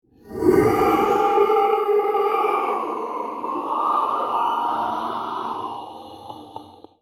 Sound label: ghostly howl